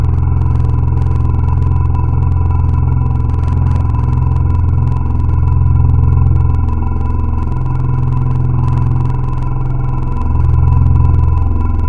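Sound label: Vocal Slow Ah, wavetable; Synthetic's Serum Collection; Echo Sound Works Core Tables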